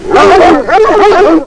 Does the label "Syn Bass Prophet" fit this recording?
No